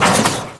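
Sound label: suspension, car; Cars; XBOX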